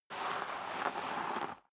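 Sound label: step boots gravel light slide long, footsteps; schritte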